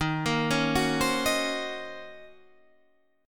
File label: D sharp Minor 13th D sharp, chord